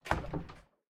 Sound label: Door Ending Close Shut; Game rip; MiSide